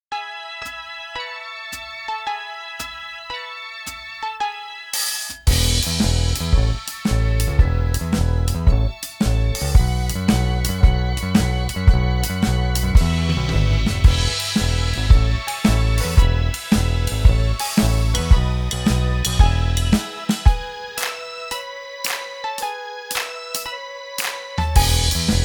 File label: Instrumental